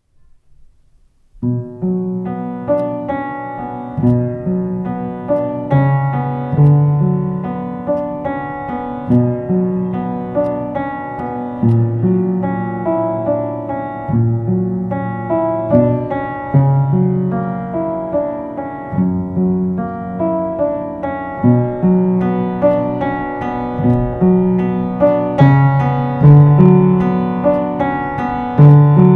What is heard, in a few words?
Prelude in B Minor, piano